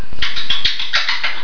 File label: clucks, parrots